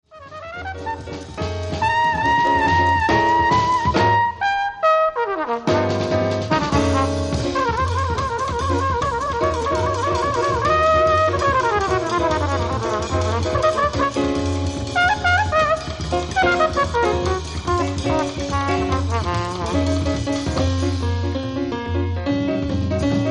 Jazz Quintet Black Samba

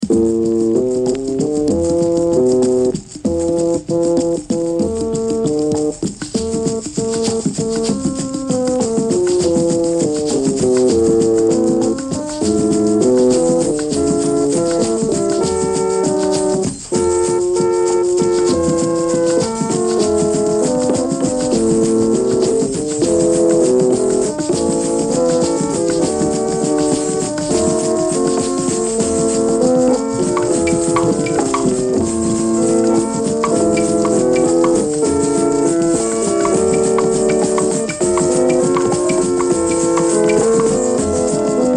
organ

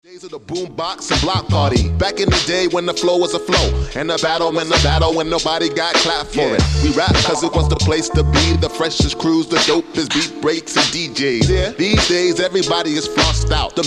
Funk Box, Vocal

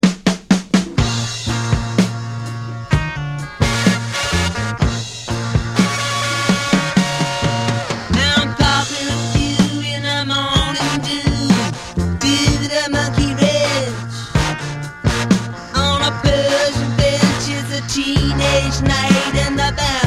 explosion mouth

